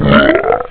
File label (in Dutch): leeuw; GoldPusher